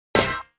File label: Ahhhhh!; mario party double screen ds wii u gamerip 2007